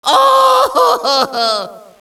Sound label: cry, Echo inside caves, underground; DS; ML BOWSERS INSIDE; Luigi